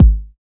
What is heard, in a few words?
Basic 808 Kick